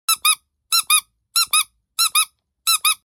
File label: Squeaker Plastic 4cm, round plastic squeakers flat insert for soft toys